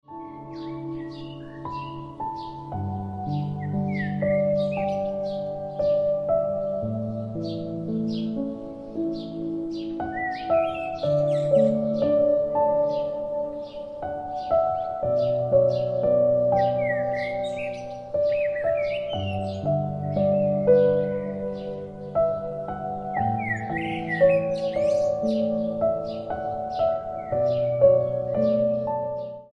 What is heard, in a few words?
Ringtone Piano Music